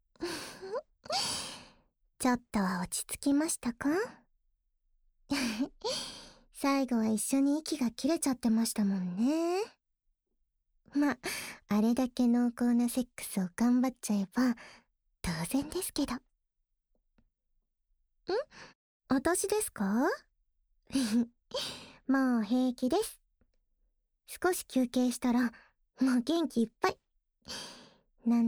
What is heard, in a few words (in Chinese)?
紧贴舔耳; 等到我们结婚之后……每天我都会来侵犯哥哥的这里♪